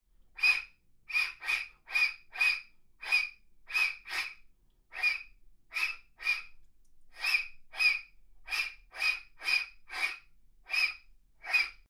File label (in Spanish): mono chillon